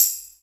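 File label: TAMBOURIN, drum machine; Drum Mashines; EdgeSounds; JD DRUMS KIT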